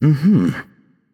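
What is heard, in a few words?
nonverbal agree; voicepack; smite; merlin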